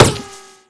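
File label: ricochet; Counter-Strike Pack; weapons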